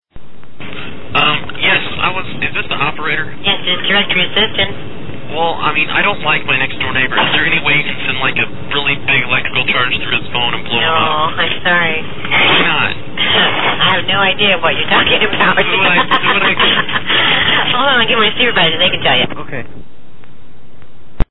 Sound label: big electric charge; Annoying The Phone Company